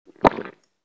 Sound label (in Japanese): gokkun, ゴックン音のみ; 射精; 膣内射精